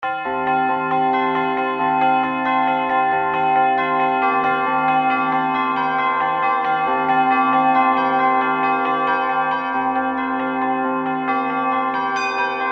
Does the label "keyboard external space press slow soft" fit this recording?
No